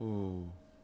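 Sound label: Longbowman Heal Vox; Civ4 warlords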